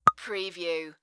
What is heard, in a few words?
Beep